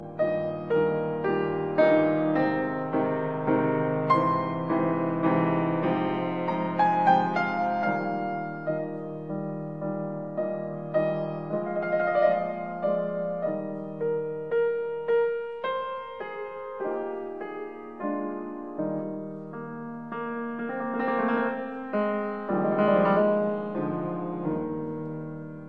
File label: Piano Sonata in B flat major, Adagio con molto espressione; No 11; Op 22